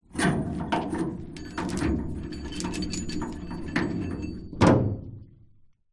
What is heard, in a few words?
industrial chain movement against large hollow metal clunk rattle